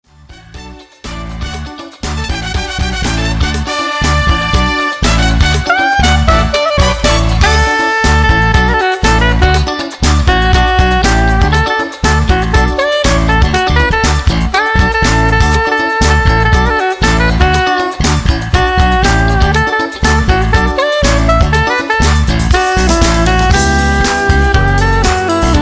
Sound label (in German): Sopran Saxophon